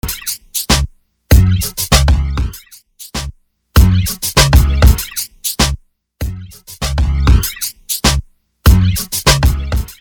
intro outro 98 bpm

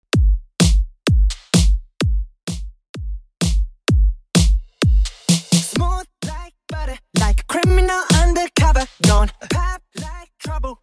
intro electro version 128bpm

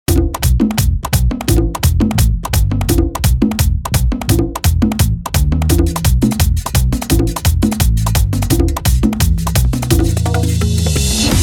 Intro 171 BPM

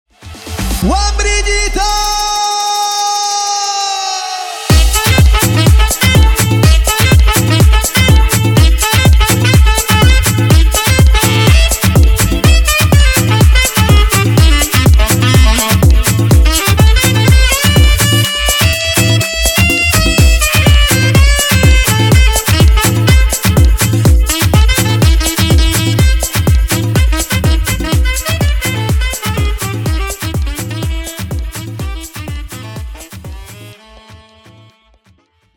125 bpm

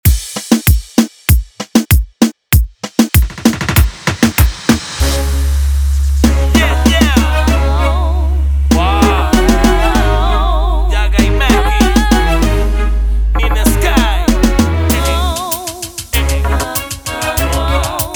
intro 97 bpm

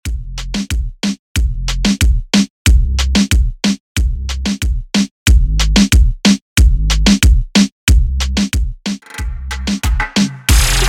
intro outro 92 BPM

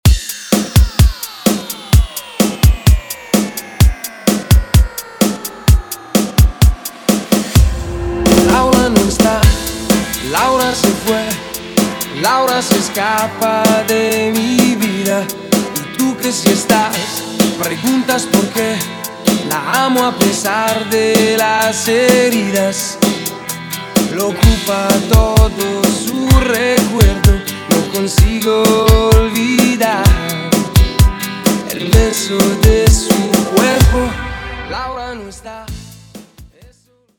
clean 128bpm

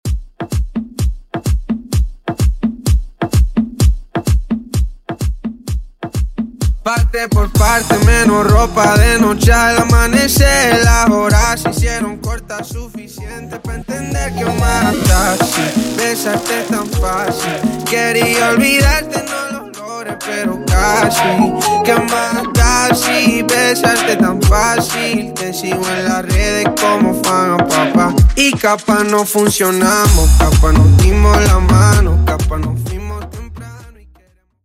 Intro Outro 128BPM

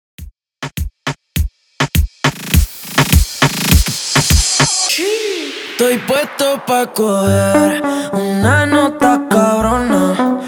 Intro Outro 102 Bpm

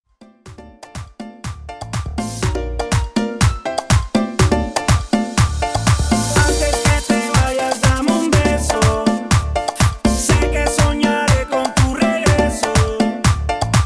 Intro Outro 122 BPM

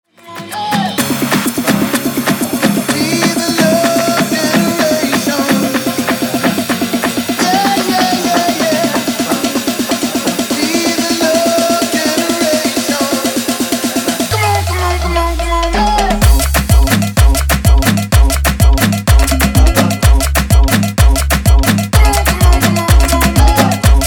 afro remix 126 bpm

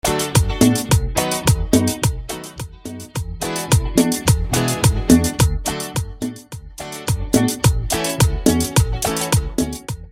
acapella 107bpm; redrum; Latin music remixes

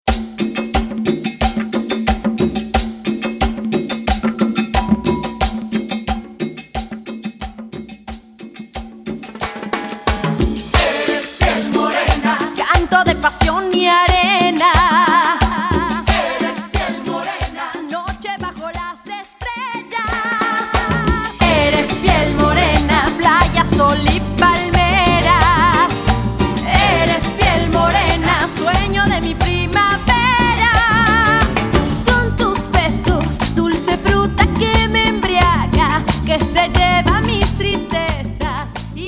Intro Chorus 90 BPM

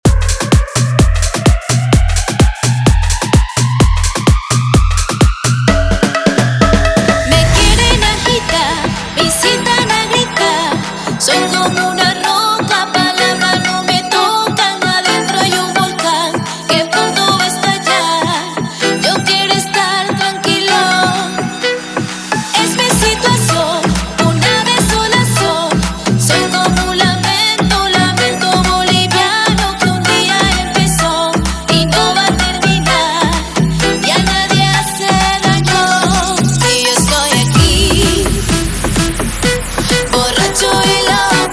aleteo 128bpm

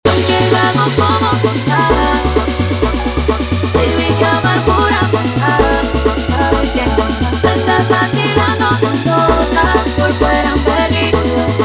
guaracha remix 130 bpm

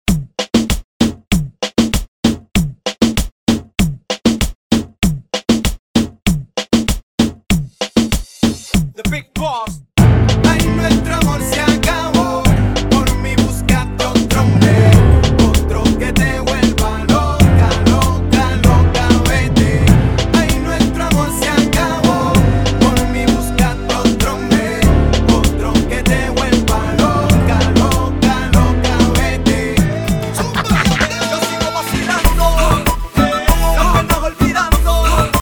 remix bpm 97

